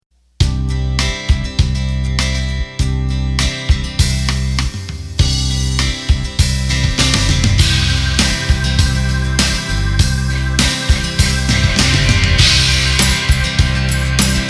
Soft Rock; roland bk7m

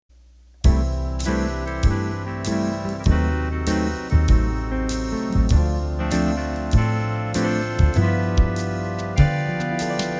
Big Band Slow

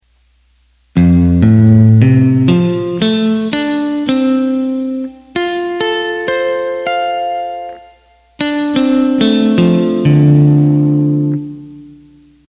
ketron Guitar

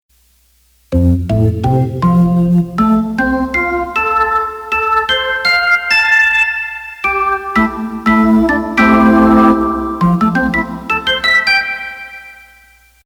Hammond B3; ketron

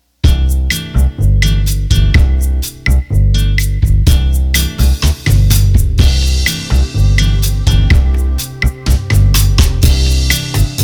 Bossa Nova; roland bk7m

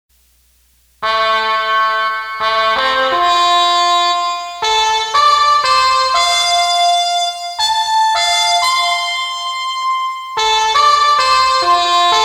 ketron Muted; Muted Miles

